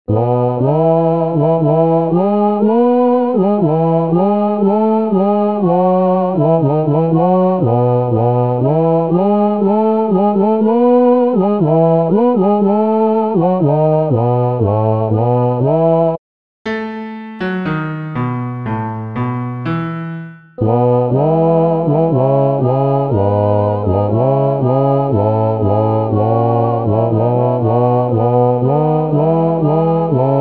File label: Bass; Avondale